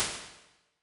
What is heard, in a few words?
Treble